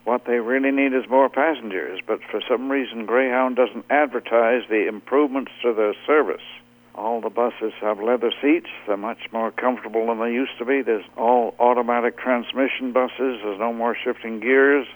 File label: Tom